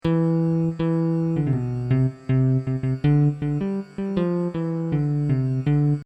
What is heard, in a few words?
Sitar C; Sitar Khani C 160